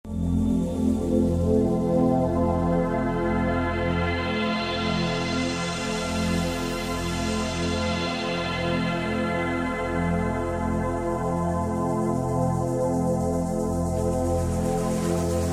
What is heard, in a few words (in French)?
meditation 432